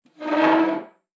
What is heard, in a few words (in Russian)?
skrip stula po derevyannomu polu